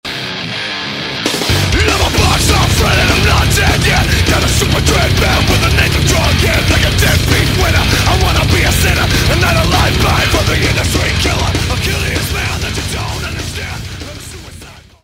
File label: escape failed; zombies